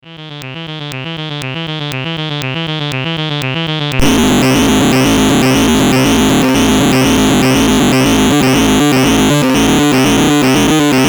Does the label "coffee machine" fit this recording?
No